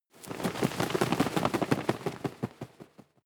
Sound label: bird wingflap, voegel; natur-tiere